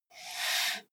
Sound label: computer mouse draw